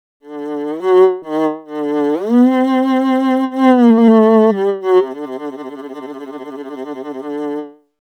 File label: viola